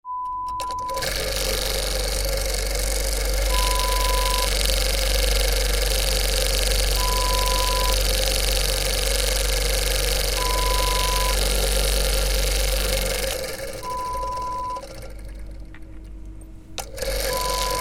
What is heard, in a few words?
Vortexer sound